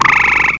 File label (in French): Grenouille